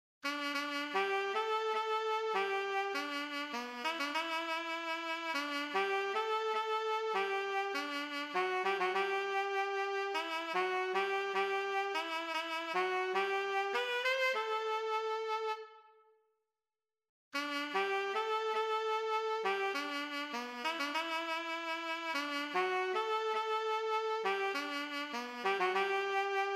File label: sax